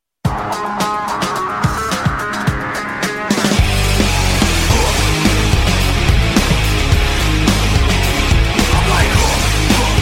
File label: Rock N Roll